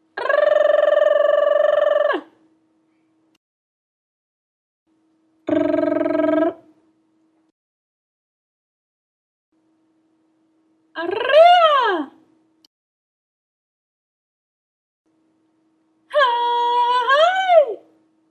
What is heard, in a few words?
Tongue Rolling